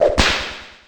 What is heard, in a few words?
whip